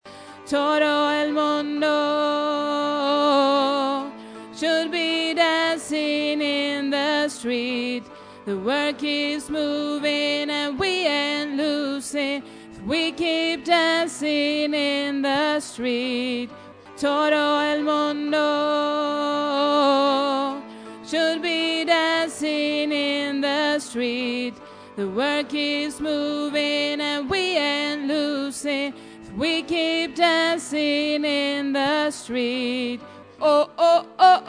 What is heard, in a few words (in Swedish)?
alt, stammor